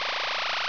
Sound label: laser